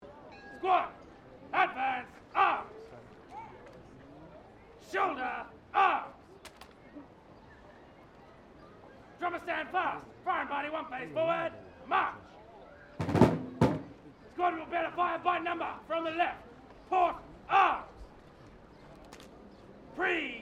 HISTORICAL RENACTMENT REDCOAT GUN SALUTE VOLLEY, Muskets firing; Gun Shots (Sound Effects:Guns)